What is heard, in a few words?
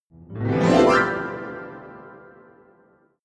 piano slide